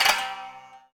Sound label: Biwa Release; Roland BK-5; Loops & Phrases